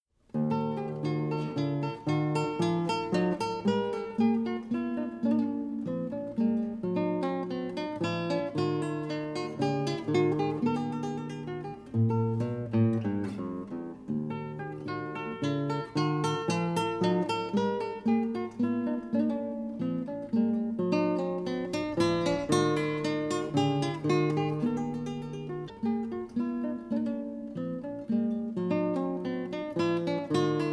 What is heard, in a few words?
Menuet Arr for Guitar; Maria Luisa Anido